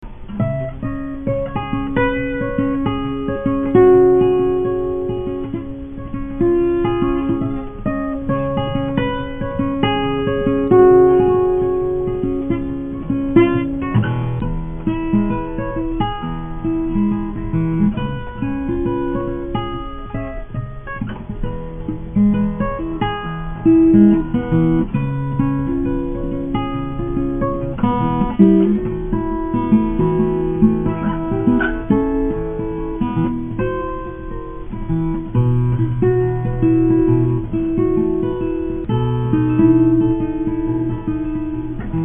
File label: Guitar Tunes